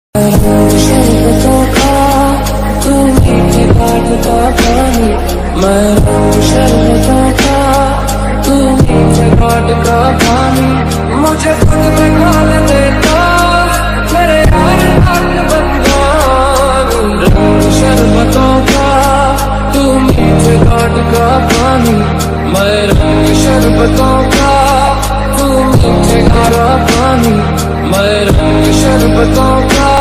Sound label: ringtone instrumental ringtone